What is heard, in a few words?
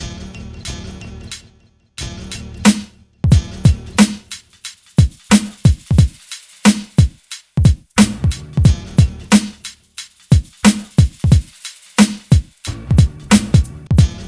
MIX, Hip Hop R n B; USB Soundscan vol.19